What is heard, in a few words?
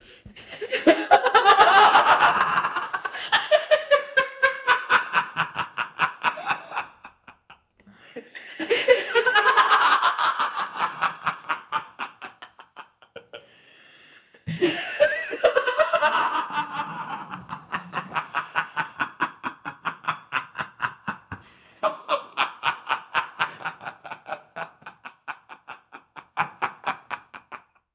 maniacal laughter in insa, asylum